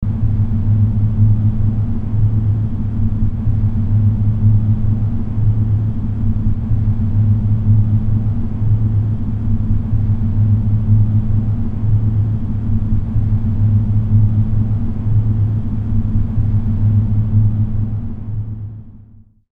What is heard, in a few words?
Snow Blowing Ambience; Shivering; The Legend of Mata Nui; Game Music; Console Games